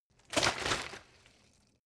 bottle water sparse shake single; berufe

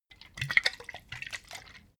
bottle vodka shake single; berufe

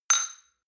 shot glass clink hard, gastronomie; berufe; soundpool

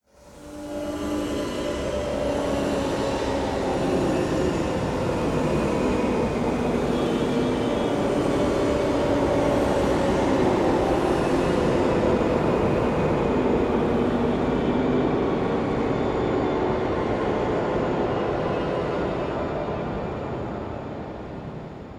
scrapes tensing scariness, drones

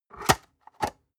storage lid slide close hard, box; objekte

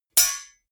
knives large scrape hit; whooshes rises

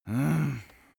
male sigh; menschen; koerpergeraeusche

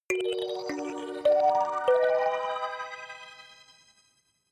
notification kalimba magical sequence minor

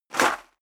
step high heels gravel light jump; schritte; Footsteps; menschen

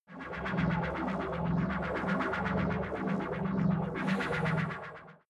generator smooth landing ship; comedy-cartoon; elektronisch

